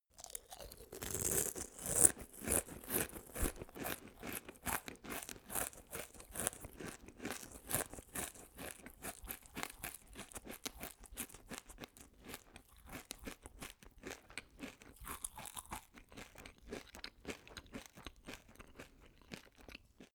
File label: male eat cereals fast